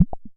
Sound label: interface button, clicks